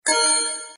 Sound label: shot